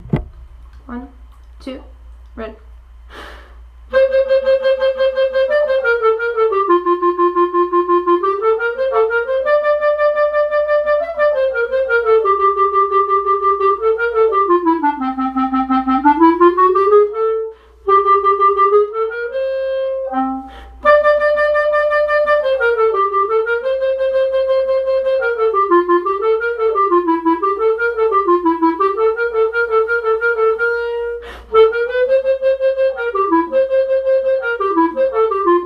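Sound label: bassoon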